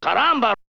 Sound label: Vocal; ZERO G - Datafile DISC 1